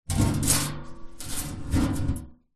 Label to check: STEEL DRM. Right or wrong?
Wrong